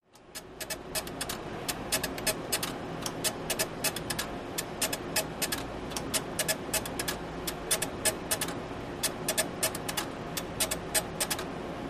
Dot Matrix Printer Computer Room Fans In Background